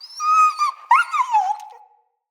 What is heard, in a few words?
Wolf Cub Trapped Call Far; Vocals; Animals